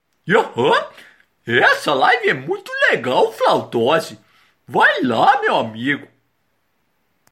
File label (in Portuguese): flautose; Pateta; Pateta vai lá Flautose